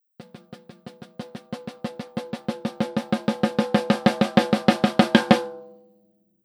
Fake SM58 Snare Roll

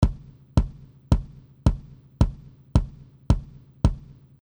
Kick Sample; DBX 160A

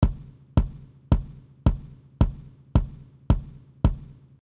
Kick Sample VC160

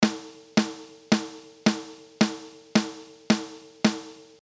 Snare Sample Dry

